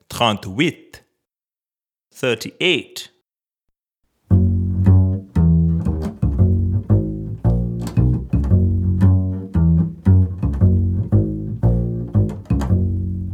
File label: Double Bass